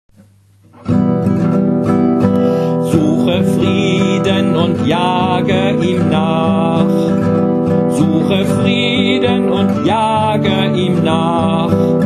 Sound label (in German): Lied; Jahreslosung 2019